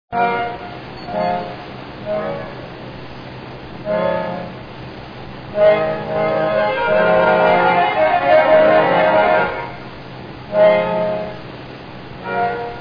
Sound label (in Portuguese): orgao